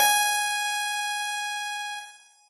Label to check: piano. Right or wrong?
Right